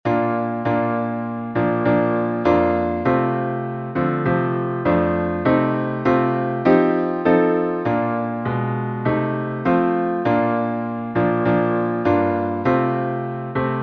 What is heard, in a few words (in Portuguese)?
salmo instrumental